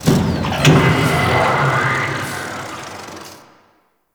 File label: Clunky Machine; Ambience; Warframe; Corpus Railjack